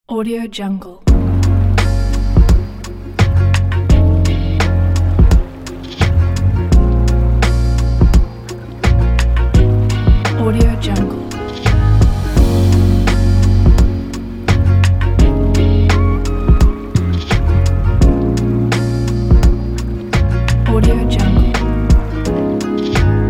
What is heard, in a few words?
lofi beats